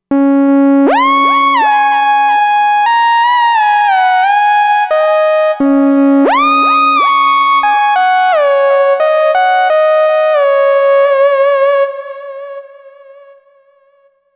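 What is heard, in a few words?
Lead Rap Whistle, synth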